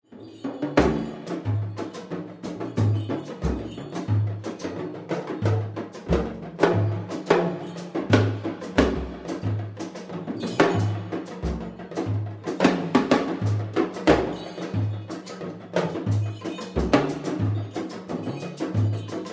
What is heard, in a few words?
drums